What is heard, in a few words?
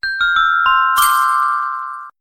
Crystal Bells Message